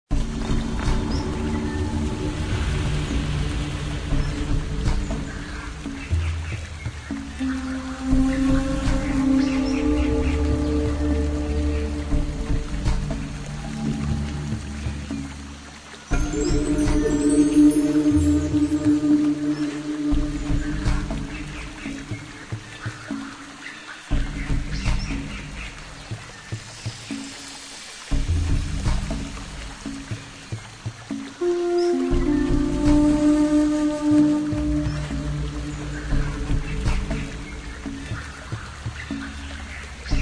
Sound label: bgm muzic